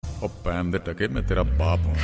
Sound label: Funny Bollywood